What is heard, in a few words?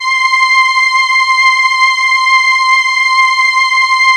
SIEL, String Machines